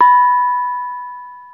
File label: BONANG HI, Bronze Gamelan